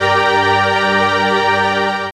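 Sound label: 21st Centaur G minor, Pads; Total Drum&Bass; 90 s Sample CDs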